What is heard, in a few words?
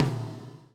GATE TOM, Drums Acoustic